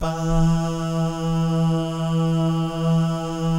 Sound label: BAH GUY, Vocal Planet Jazz & FX; ILIO; BAHS GUYS